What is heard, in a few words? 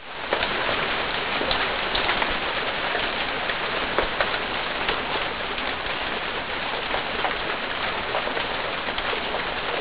rain